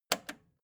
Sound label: record player repeat jump out click slow